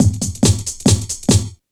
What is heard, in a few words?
loop, Drum & Bass, 140 BPM; Ueberschall; Drum & Bass Resonance